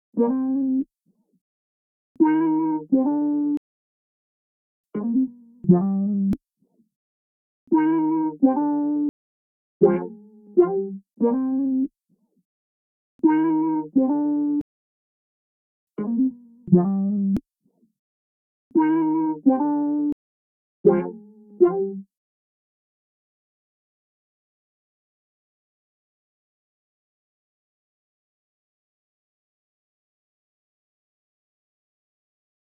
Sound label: 87 BPM C Min Wah Lead; Melody; RnB; Loop Stems; Cymatics; 2022 Melody Collection; RnB Pt 2; Thunder